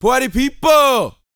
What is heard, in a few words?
VOCAL F; ILIO